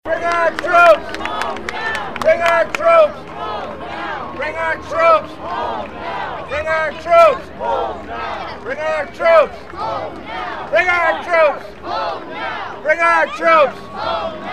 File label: chant with applause; july 4 brings anti-war message from ct to dc